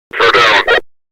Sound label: flare down